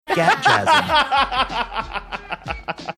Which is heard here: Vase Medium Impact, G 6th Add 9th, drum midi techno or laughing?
laughing